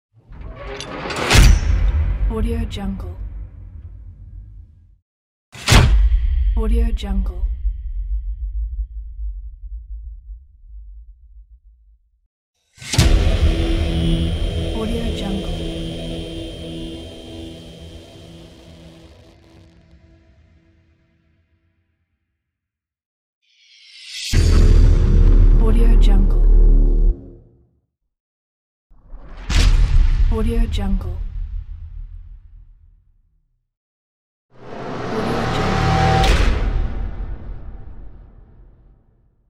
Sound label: trailer and cinematic riser